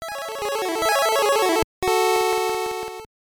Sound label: Logo (Game Boy Version); Capcom